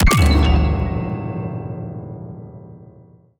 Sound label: piano hit